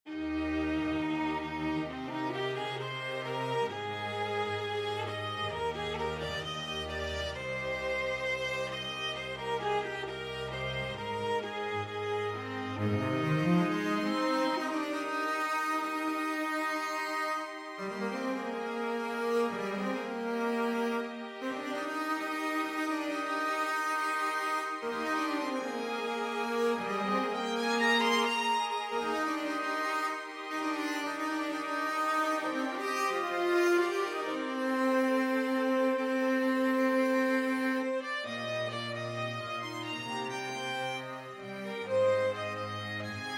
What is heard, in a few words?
String Quartet